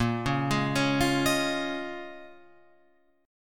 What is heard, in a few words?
Bb Minor 11th, down, Guitar, Open F; arpeggiated; JGuitar